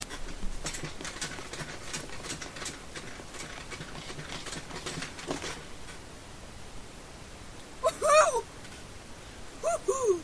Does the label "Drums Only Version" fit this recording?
No